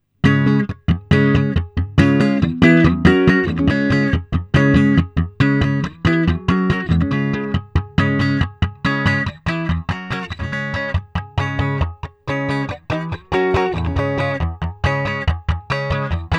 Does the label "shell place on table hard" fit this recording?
No